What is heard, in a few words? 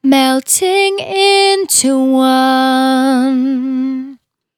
Finally 70bpm C, Velvet Vocal; Zero-G